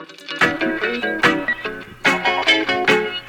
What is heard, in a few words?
Skank